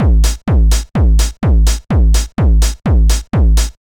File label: BD Industrial